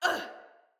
Pain Female; Battle; Envoy

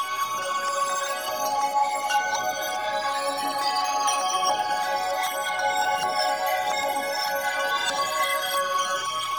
Gas City Obelisk Chimes Loop; Warframe; TheNewWar; Ambience; CrpGasCity

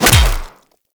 Melee Hammer Impact Flesh; Hammers

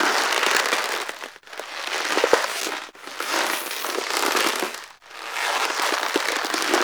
Balloon Rise Loop; Festivities; Warframe; Follie

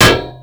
Metal Pipe Drop; Warframe; Ambience; NineteenNinetyNine

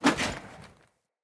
Foley Action Armour Roll; Player; Deora Armour

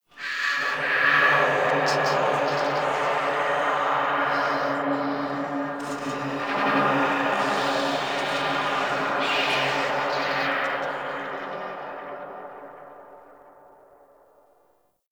Whisper; Ambience; Dante Unbound; Warframe; Entrati; Dead End Secret

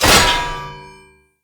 Great Sword Melee Impact Metal; Weapon Impacts; Founders Great Sword; Environment

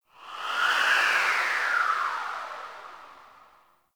gas city distant wind textures

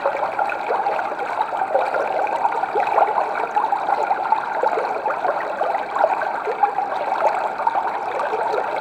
Clan Dojo Small Water Flow; Warframe

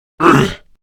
Pain, Male; Warframe; Grineer Male; Battle Barks

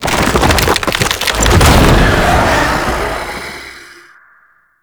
Loot Crate Open; Warframe; Duviri Murmur; MITW Loot Crate

